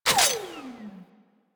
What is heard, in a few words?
Emplacement Mech End; Grineer